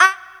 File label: QUACK HORN; E-MU Formula 4000 Series Vol. 2